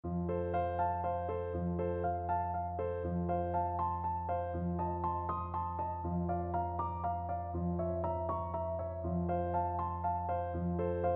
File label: schubert ave maria, bassoon